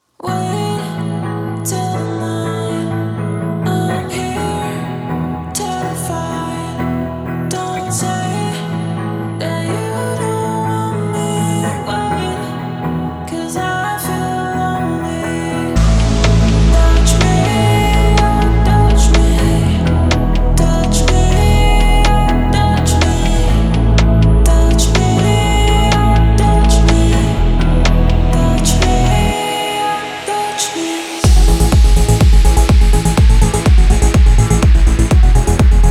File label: Sad Stutter House; Seven Sounds Sad Stutter House; Stutter House风格采样包